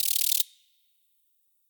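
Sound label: ambience beetle; wingsofriches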